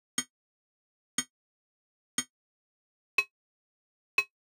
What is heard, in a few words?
UI Sound Pack Select; Kpow Sounds